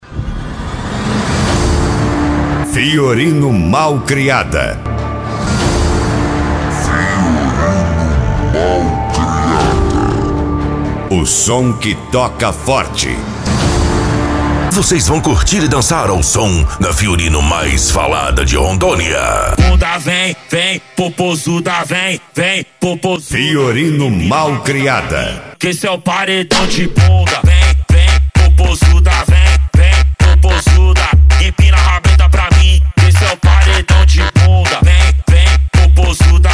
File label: mega funk bass